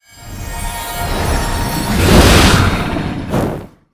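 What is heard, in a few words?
king lion spawn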